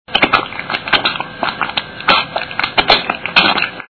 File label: bath; habilidade